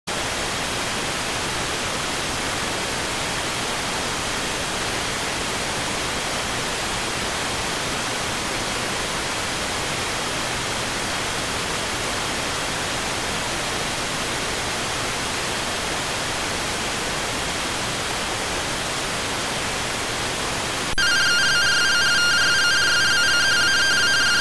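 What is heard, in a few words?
radioteletype receive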